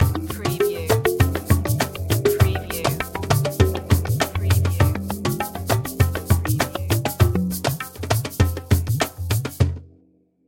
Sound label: Musical